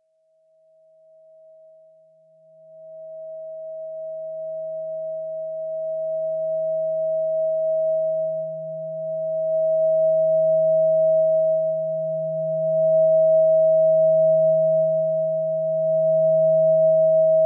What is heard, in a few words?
639Hz Energy Balancer